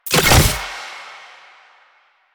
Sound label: Fuel Projectile Drop; JadeShadows; Ascension